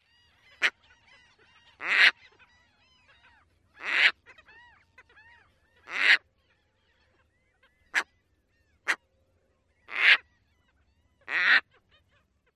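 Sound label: barks and grating calls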